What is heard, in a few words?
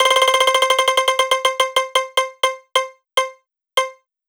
clicker mount